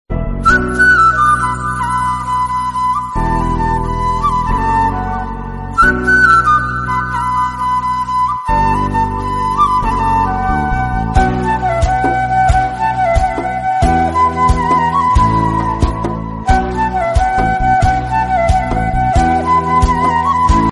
lovely background flute music tone

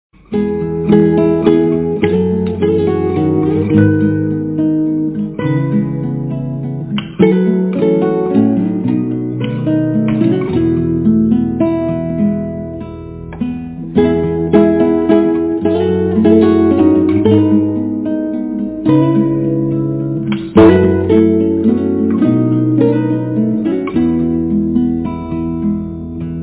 guitar exercises ringtone

